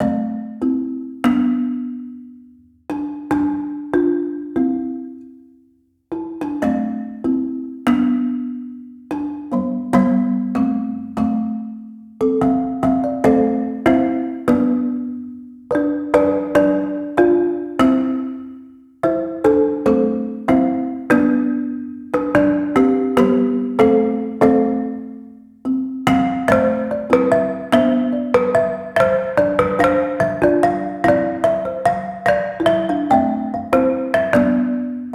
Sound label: marimba de chonta; south america; tribe instruments